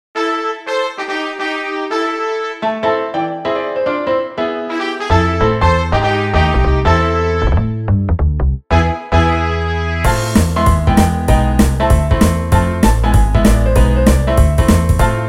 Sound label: swing melo